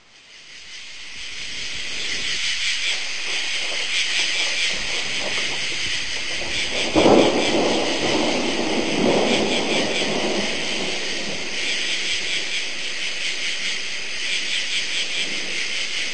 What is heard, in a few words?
rainfor, Sound FX Zoo and Nature